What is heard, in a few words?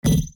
error